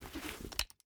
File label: python deploy; Rust